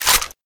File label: shotgun shell; weapons; kuilu